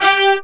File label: G4 BRASS